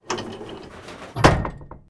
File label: Vehicle Hatches; Red Orchestra 41-45